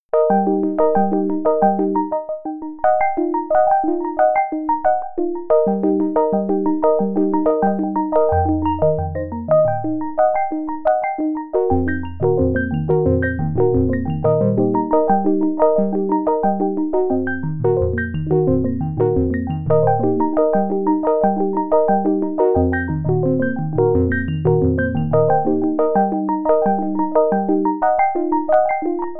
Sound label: Marimba Party; Solo Improvisations